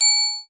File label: triangle; Triangle Kit; ETHNIC and WORLD PERCUSSION